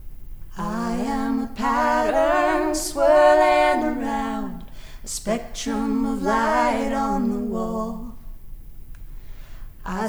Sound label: Depth check